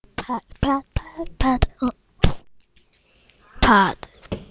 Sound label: pad